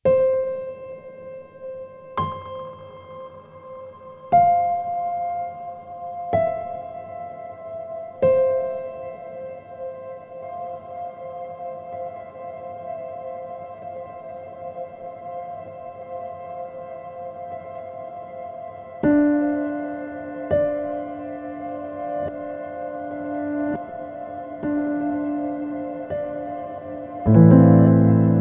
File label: Mood Mk2 Piano Reverb Tape